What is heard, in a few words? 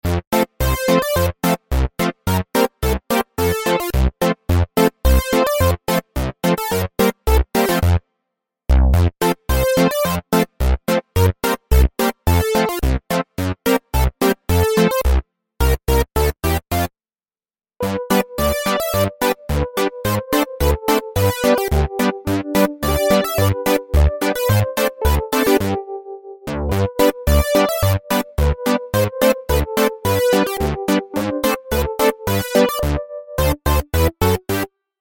background music, instrumental